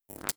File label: wraith spark; Stellaris; guardians